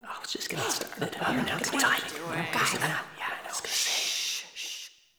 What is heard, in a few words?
Student Confused; Cinematics; Warframe; TheNewWar; Zariman